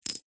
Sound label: leisure game plastic small discs set down; zapsplat